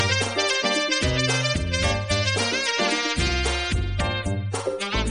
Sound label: funk bassline with a jazzy saxophone solo